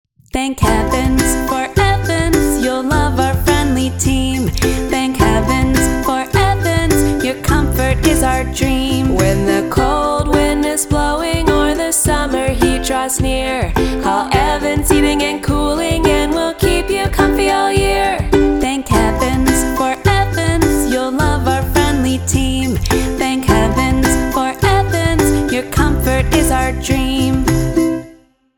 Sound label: long version with vox; Jingle; Niagara Falls, ON